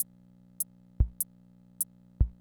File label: Kent K-220 Rhythm System Loop, drum machine